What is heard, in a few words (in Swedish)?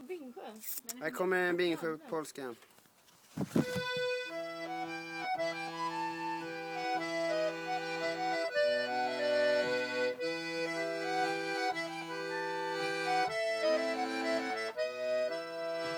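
polska e moll a dur; Bingsjopolska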